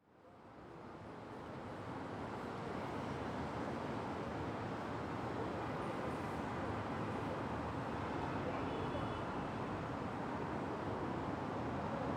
Ambience, People, Tour; City Sounds

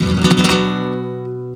GUIT CHORDS; Best Service; World Colours

